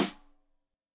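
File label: Snare Stereo, Drums; Deeply Sampled Drums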